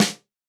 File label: Hip-Hop Kit Snare; Roland Juno-D